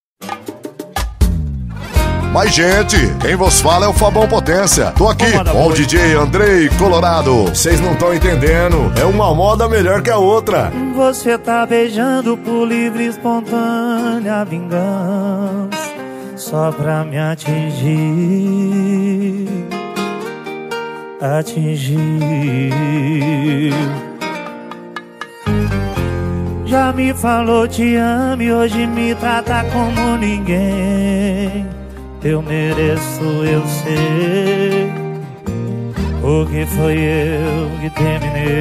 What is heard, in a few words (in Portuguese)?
sertanejo com locutores de rodeio